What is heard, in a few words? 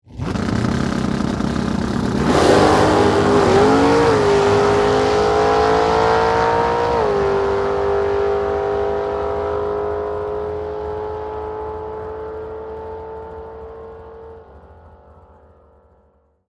Dragster Away, Sports, Dragster, Drag, Away, Two, Cars; Race Cars; Blast Wave